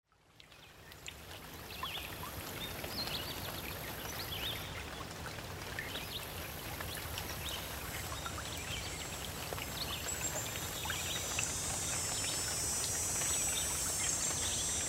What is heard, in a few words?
binaural beats birds in the garden with a fountain; sleep loop